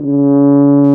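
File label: brass, FR HOR, F.Horns; Roland L-CDX-03 Disk 2; French Horn; F.Horn 1&2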